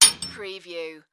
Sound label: Metal Clanks